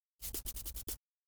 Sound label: wolf bored; LoL; monsters